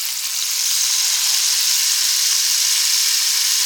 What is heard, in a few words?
RAINSTICK; ETHNIC PERC; Instruments & Percussions; InVision Interactive; Lightware VOL-1